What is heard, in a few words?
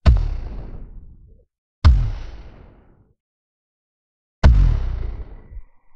Monster Stomp